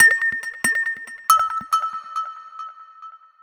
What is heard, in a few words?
Addon Loop, Drum Loops 140bpm, Perc Loops